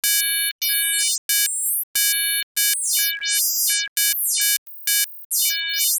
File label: signal